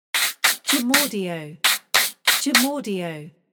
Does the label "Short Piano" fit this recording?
No